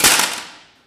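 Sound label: physics clipboard impact large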